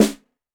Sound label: Snare, Roland TD-20